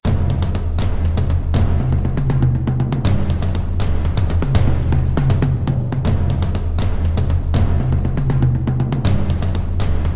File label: wardrum; Hell Warders